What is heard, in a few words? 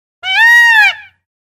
peafowl sound; animal sounds